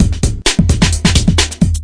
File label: 130 bpm, thuddy clappy break